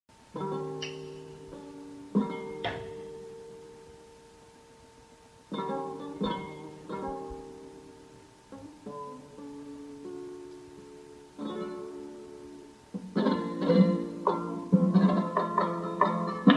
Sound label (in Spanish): Tangos